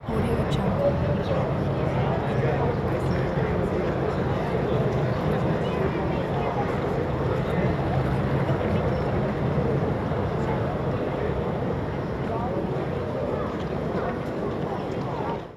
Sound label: busy city plaza ambience